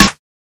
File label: verby steel snare drum sound c key